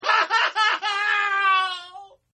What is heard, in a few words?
Scream Male; Screaming; Voice; Partners In Rhyme